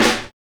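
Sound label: SD, snare drum; X-Static Goldmine 1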